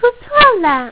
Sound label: Error; RubikSolver